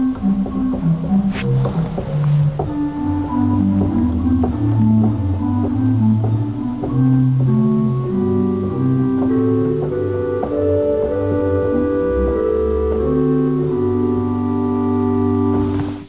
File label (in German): Coppel